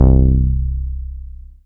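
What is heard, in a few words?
BAS MKS-50, bass, Roland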